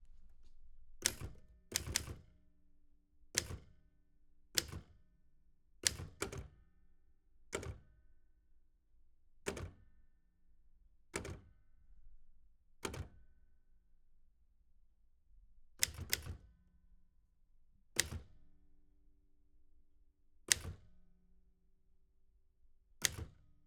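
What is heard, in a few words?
Typewriter Halda classroom small close distant; Pole Position; Typewriters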